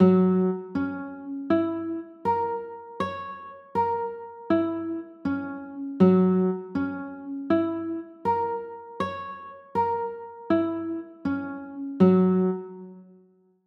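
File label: G flat 6 chord, guitar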